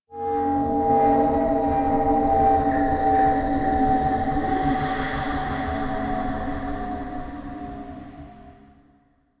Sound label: Asteroid Howls, Ambience; Warframe; Grineer Asteroid